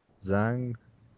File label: bell